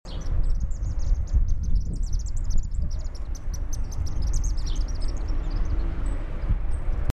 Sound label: Chaetura meridionalis